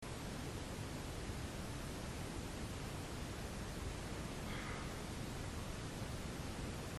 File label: breath small masonic room empty